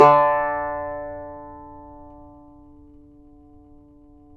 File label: yayli tambur